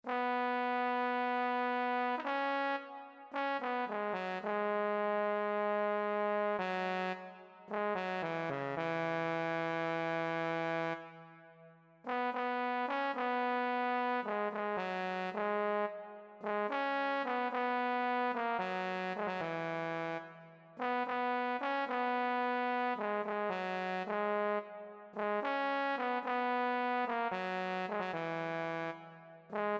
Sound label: euphonium